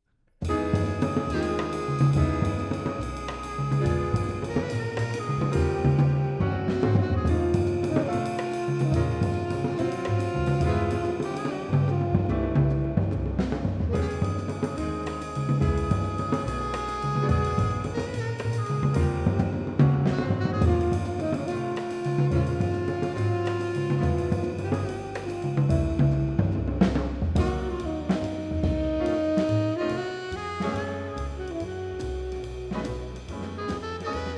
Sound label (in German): RCA 44 Brikett, microphone; Das Mikrofon, Vol. 2; The History of the Condenser Microphone